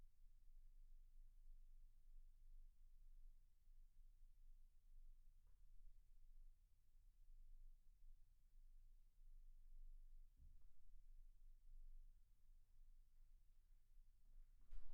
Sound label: MAIN VOC